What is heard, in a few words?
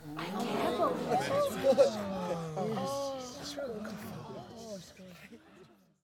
crowd Chatter guild; spellbound